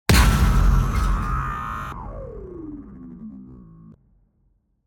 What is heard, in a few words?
Cinematic Hit; soundbits